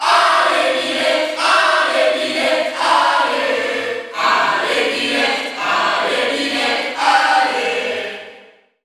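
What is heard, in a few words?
Cheer French, crowd; Byleth; SSBU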